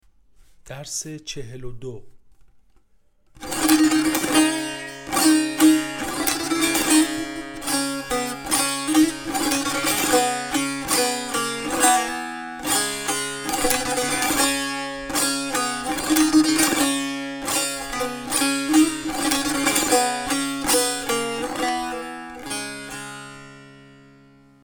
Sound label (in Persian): مشق تنبور; تنبور جلد دوم